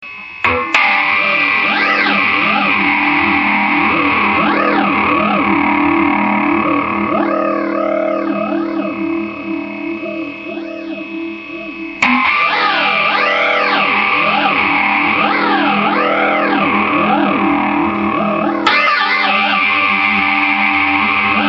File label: mudphase